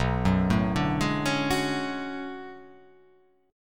B Minor 13th, chord; Bm13 arpeggiated; x,2,4,2,2,3,3,3; JGuitar

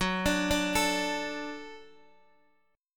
Gb Minor Sharp 5th Gb Guitar DADGAD

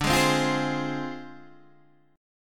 D Minor 7th Flat 5th Guitar DADGAD

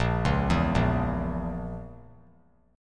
Bb Diminished Bb, Chord; arpeggiated; JGuitar

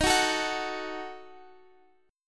Eb Diminished, Guitar, Open F; Ebdim strummed

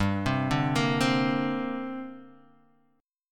C 7th Suspended 2nd, guitar

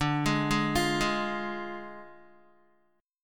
D Diminished D, Guitar; Ddim arpeggiated; 9,11,8,10,8,x

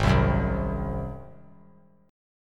Ab 7th Ab down; Ab7 strummed; JGuitar

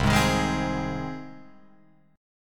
C Minor Sharp 5th, Guitar, Open F, Cm#5 chord; strummed; JGuitar

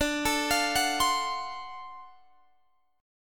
D 7th Sharp 9th, Guitar Open G

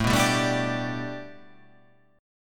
A 7th Suspended 2nd Suspended 4th; x,12,9,12,9,9,9,11

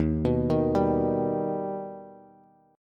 Eb Augmented Eb, Bass; arpeggiated; JGuitar

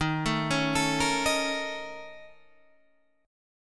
Bb Minor Major 7th Double Flat 5th; BbmM7bb5/Eb arpeggiated

